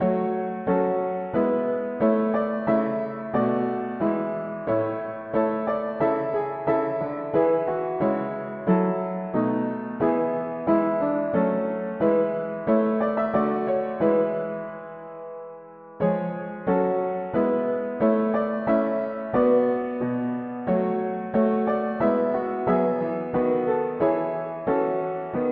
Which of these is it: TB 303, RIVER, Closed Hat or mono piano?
mono piano